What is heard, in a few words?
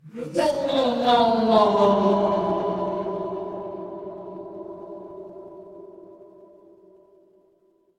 horror ambience dark